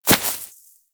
rodent hop step rear grass, forest rodent footsteps; Foley; Warframe; Characters